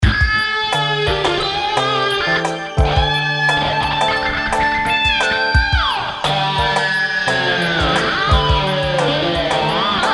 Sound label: Solo improvisation